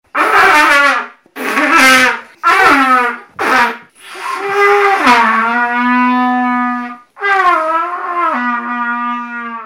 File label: sounds of trumpet